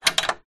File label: household bolt metal gate movement